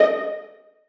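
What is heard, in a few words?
violas pizzicato d#5; Sonatina Symphonic Orchestra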